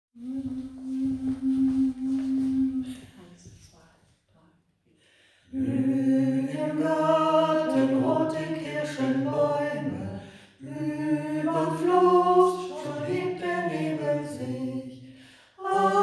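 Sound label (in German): gesungen, Chor; Lieder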